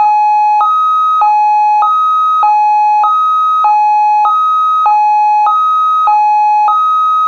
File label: hilo, sirens; code3 z3